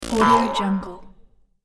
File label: jump static